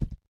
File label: Step